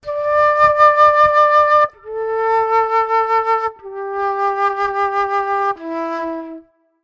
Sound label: flute loop 125; melodic samples; rhythmlab